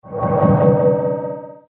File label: alarm; Страшные звуки для монтажа